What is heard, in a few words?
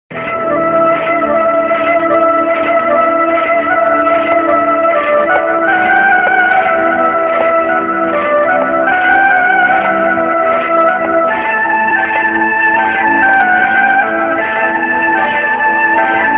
guitar in love; classical